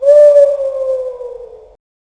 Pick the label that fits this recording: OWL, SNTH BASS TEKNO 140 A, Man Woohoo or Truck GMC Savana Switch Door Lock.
OWL